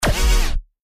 Whirr

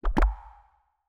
Bubble; Eden

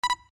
Redirect Beep; Homeward Journey

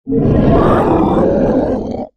Killed; Sins Mod

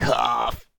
Cough

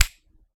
Claw Snap; Anarchist Mod

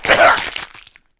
Cougher Death; Avalon

